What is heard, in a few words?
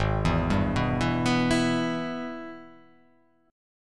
Minor Major 7th Sharp 5th; Gsharp; 4,7,5,4,4,6,6,x